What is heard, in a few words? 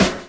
DRUM CHOP; AMG Gota Yashiki Groove Activator